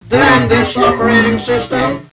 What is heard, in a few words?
death; hunger; franklin